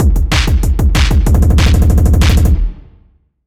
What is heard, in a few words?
boss music intro; super crush k.o.; cutscene1